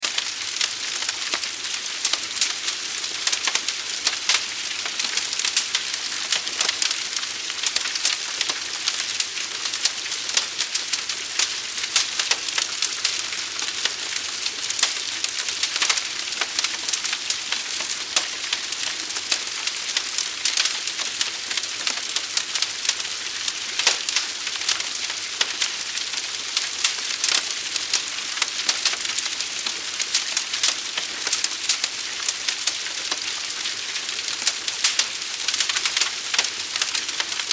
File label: Fire Forest Brush, Fire Forest Brush Exterior Crackle Sap Whining Timber Loop; Flames; Flame Sound Effects